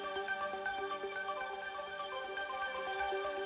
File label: reel Spin; netent; dazzle